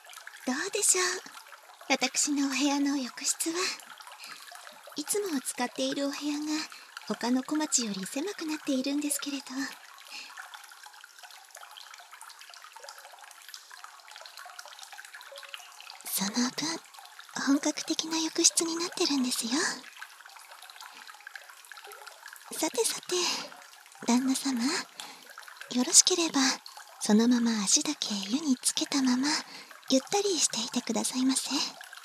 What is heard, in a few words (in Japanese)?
頭→シャンプー、頭マッサージ（バイノーラルマイク収録 効果音あり）